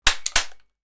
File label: gun trigger click; mechanical